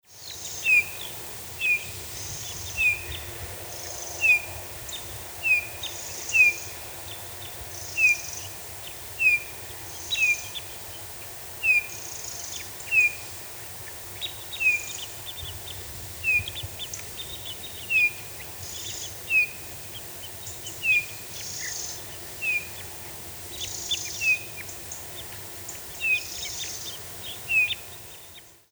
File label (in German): Vogel; Kens Kirkeby; xeno-canto